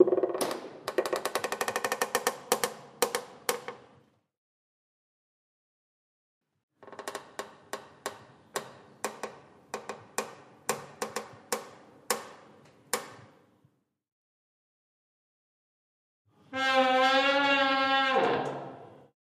Door Metal Creak; hinges & creaks

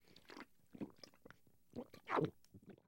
Beer Gulps Close Up, eating & drinking

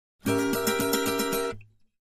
Acoustic Guitar High 7th; guitars

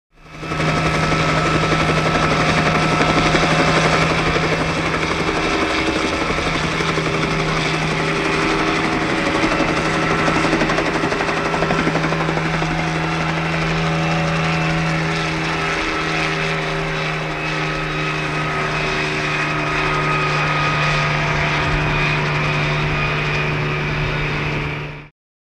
Helicopter Hover, sioux

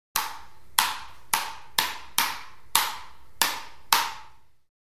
Ping Pong Ball Paddl

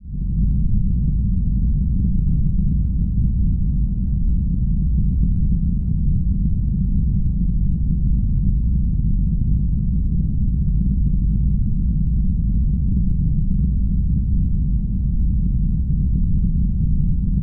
ENGINE HUM, drones & tones

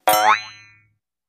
Ping A Boing, boings

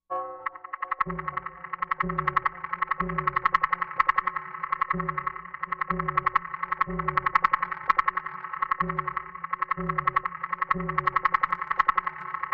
Stealth Spinner Machine, machines winches

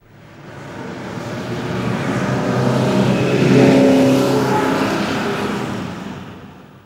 Bus Fst By

